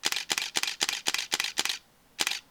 shutter noise; Canon EOS-100D

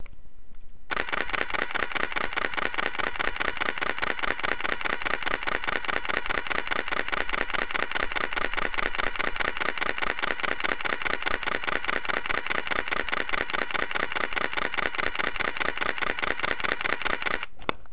high; Canon; EOS-30D

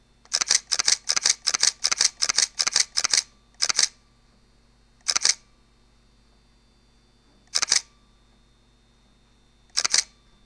continous, Pentax istDS

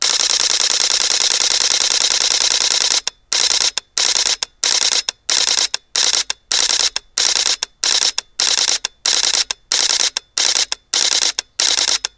AlphaSLT-A55 continuous speedpriority, Sony; JPEG

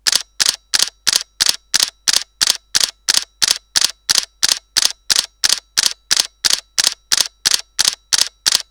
continous L JPEG, Canon EOS-50D

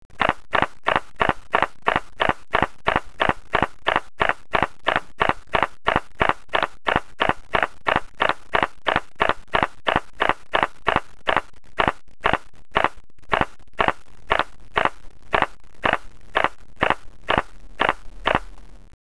raw low, Sony Alpha DSLR-A700

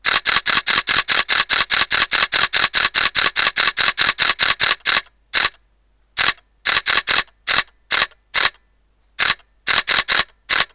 Alpha DSLR-A550 continuous hi JPEG; Sony

